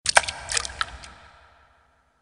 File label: notification; Infinix; A88